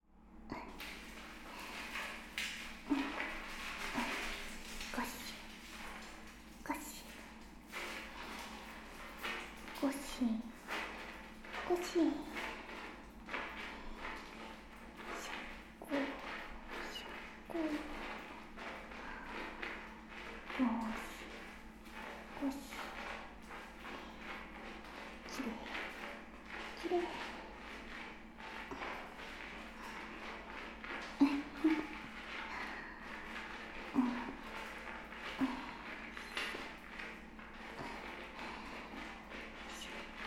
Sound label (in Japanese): あわあわシャンプー; ASMR; えろまま